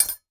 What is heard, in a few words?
impact metal small; sound effects one